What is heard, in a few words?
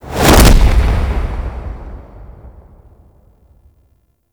Spawn; Grineer Manic; Attack